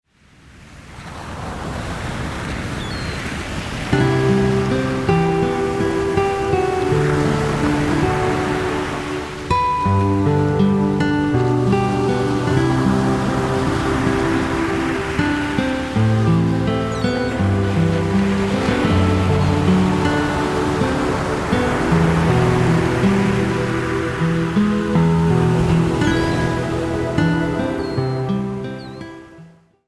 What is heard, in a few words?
Ocean and Music